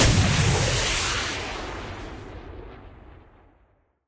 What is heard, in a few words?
fire, battleship